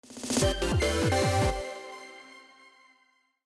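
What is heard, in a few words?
Training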